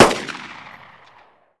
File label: Weapon Rifle Fire